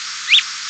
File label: whup, Empidonax traillii; Passeriformes; Tyrannidae